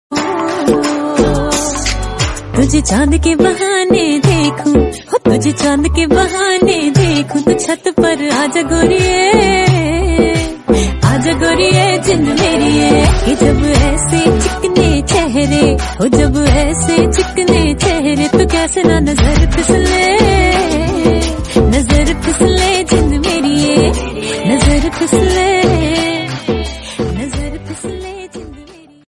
female version

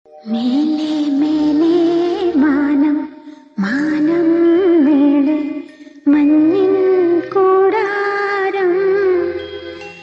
malayalam song

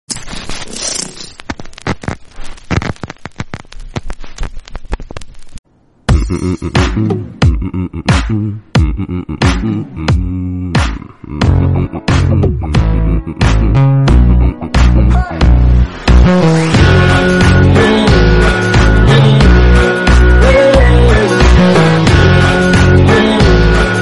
entry song remix

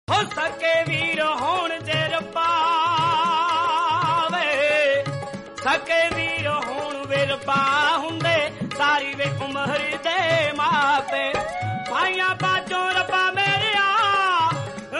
punjabi

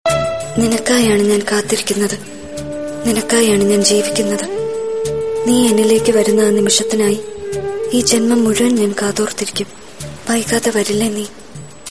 bgm love; arya

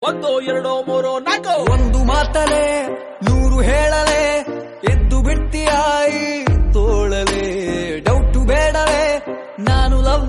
kannada song

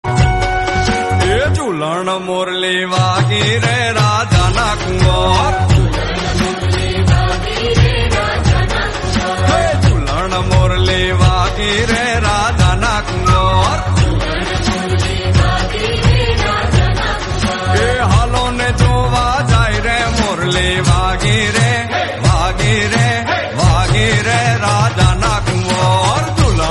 Gujarati

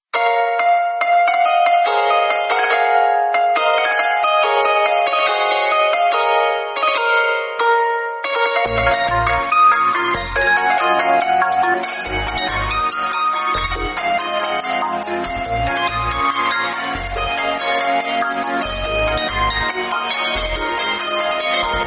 bgm, tamil

